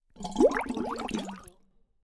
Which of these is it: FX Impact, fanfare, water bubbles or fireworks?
water bubbles